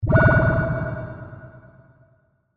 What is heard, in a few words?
Vintage Ray Beam, Scifi, Vintage, Ray, Beam, Large, Cannon, Science, Fiction; Spacecraft Sound Effects; BlastWave